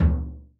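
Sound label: bass drum soft; Northstar - New Gold VOL-2; 50S BRUSH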